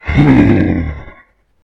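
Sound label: Running attack; I Am Weapon Revival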